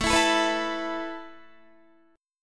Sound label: A Suspended 2nd A Guitar; Asus2; strummed